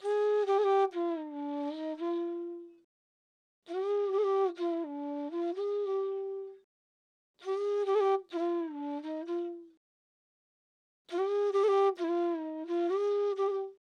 Trap Flute Fmin 130 BPM

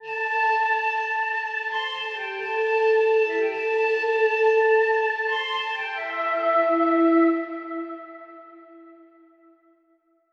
Japan Flute 134bpm F maj; New Nation

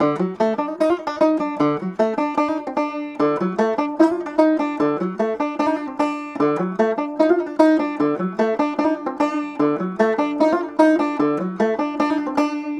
Eastern Banjo 140bpm D

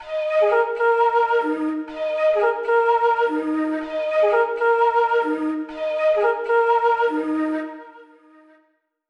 Radial Flute 126bpm C maj; New Nation

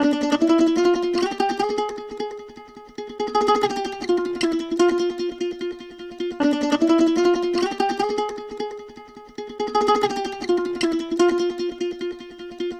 Eastern Mandolin 150 bpm D

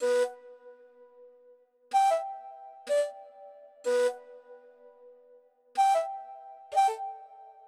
Indian Flute 125bpm Gmaj; New Nation

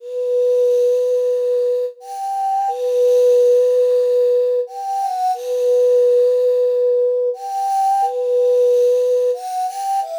Windy Flute 90bpm E min; New Nation

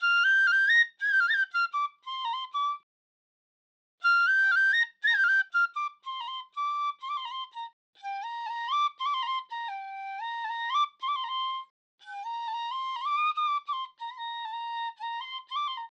Dancehall piclo Flute Amaj 120 BPM

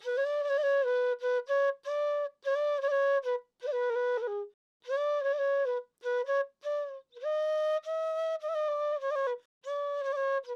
Trap Flute Bmin 100 BPM

